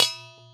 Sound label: metal bowl; source of tales